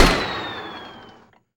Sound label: Assault Rifle Shot Loop End; Weapons